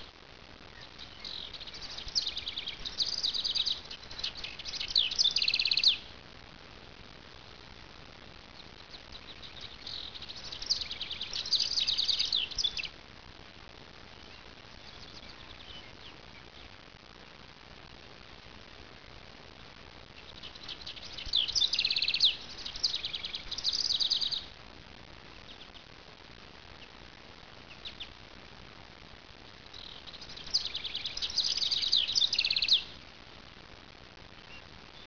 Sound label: Birdhaus, eastern washington birds